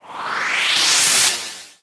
Light Blast

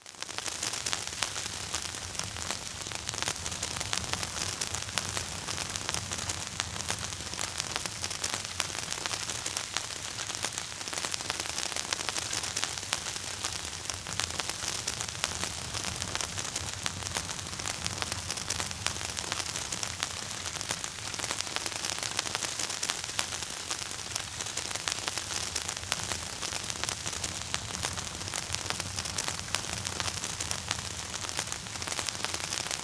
burn

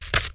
battle Beak foot

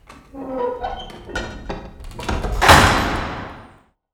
Gate Open

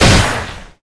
Ball Crush Skill; Seer; GamigoNA

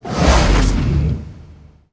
Robo Atk

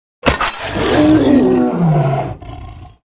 Were Boar die

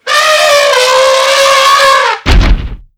Mastodons Die

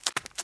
heart mouse run

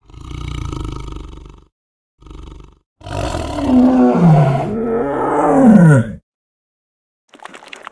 Tiger idle

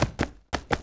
Unicorn; EmuClient

